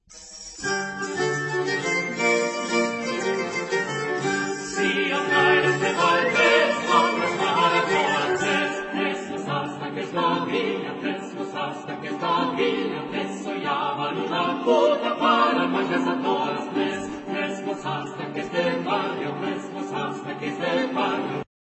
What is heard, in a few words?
Spanish Court, Medieval Music; Authentic Medieval Music